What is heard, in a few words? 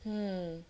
Hmmm